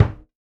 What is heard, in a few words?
kick second line hard